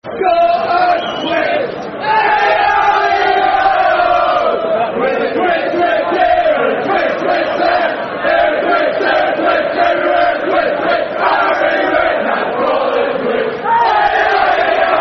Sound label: Got A Twitch [Football Chant (Soccer Song)]; Harry Redknapp's; Southampton Sfc